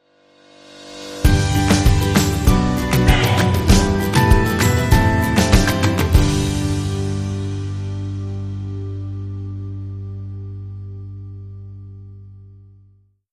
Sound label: Indie Sting